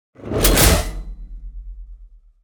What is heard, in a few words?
Industrial steam release impact